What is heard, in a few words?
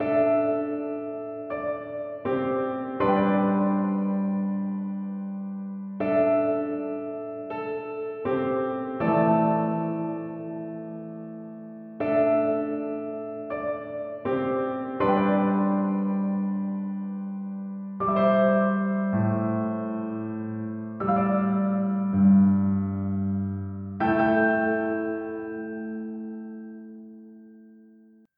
Piano; OpenWorld